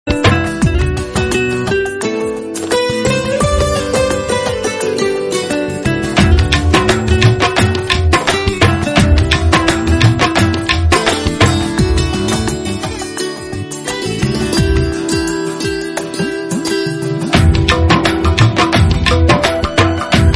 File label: Hindi remix